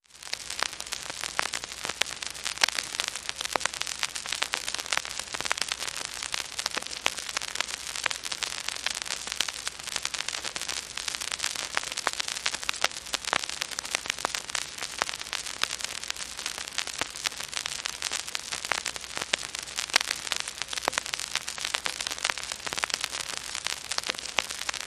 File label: Light Record Groove Noise